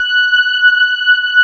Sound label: electric pianos; Wizoo Electric Pianos